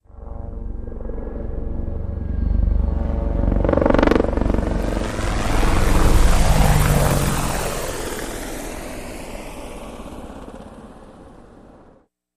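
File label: Apache Tilts Forward, ah-64 apache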